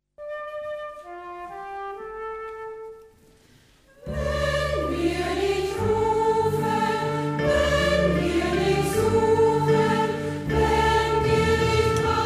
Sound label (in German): gospelchor kyrie